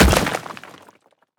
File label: rock explo